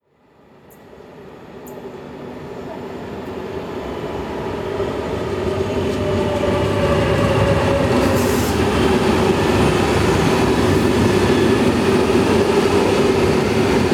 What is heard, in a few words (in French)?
trains diesel et electriques; sonotheque